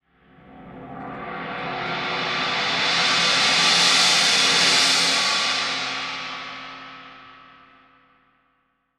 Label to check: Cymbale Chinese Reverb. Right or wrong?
Right